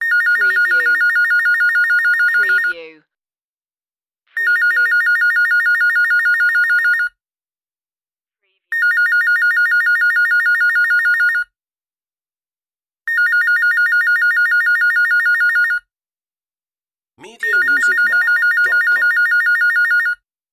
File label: Telephone Ring Office; Sounds of ringing telephones, cell phones, mobiles ringing.